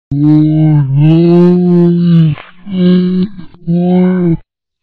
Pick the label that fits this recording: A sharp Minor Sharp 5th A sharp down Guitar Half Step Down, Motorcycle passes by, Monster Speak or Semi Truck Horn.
Monster Speak